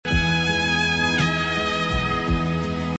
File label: Melody Cmaj7 ONLY